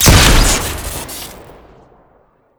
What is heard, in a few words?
gun shoot short crit; tf2